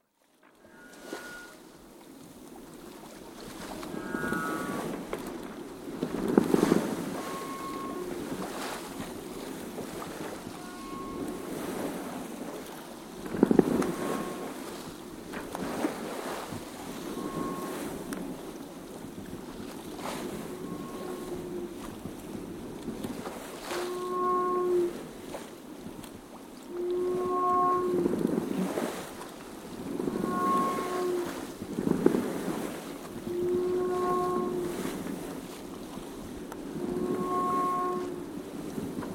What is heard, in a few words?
Yacht, sailing past channel marker buoy, close hauled sai, wind force 4 (horn on buoy) (30ft sailing yacht, Halmatic 30, GRP, sloop rig); 'Alice May'; Boat; BBC